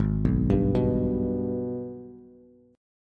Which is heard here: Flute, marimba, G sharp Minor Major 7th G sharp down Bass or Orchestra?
G sharp Minor Major 7th G sharp down Bass